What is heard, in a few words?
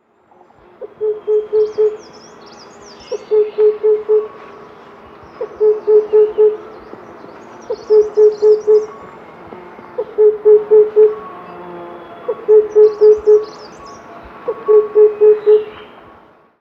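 oriental cuckoo voice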